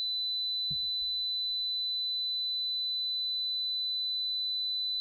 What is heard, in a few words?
piezo buzzer 4khz; PS1240